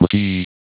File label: attack; votemap